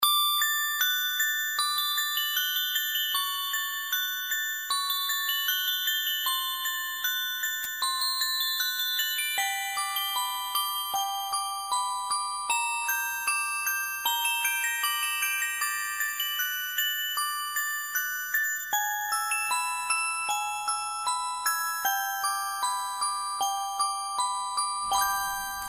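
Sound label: music box melody; muzykalnaya shkatulka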